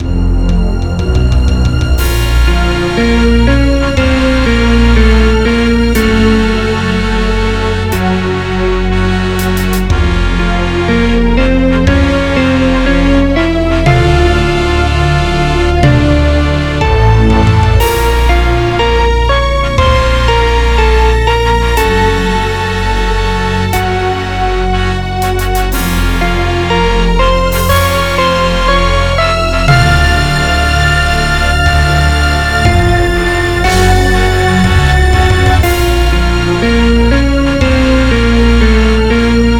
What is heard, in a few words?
Lose, midi; warcraft reworked midi soundtrack 2019